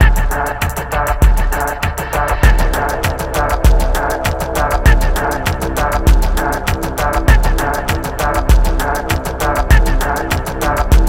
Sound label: Spooky Remix